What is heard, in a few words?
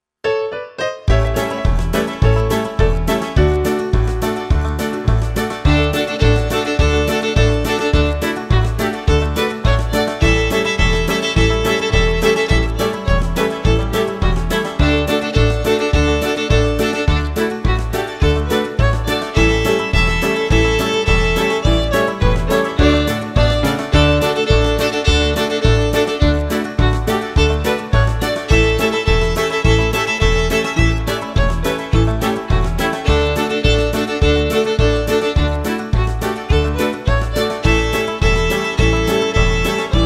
double stop shuffle